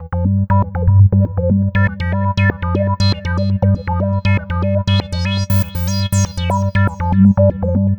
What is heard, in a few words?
Lead